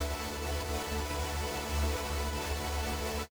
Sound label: Giant Saws, pad